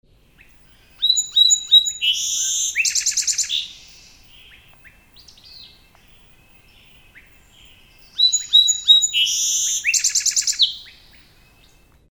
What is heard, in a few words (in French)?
bruant chanteur, chant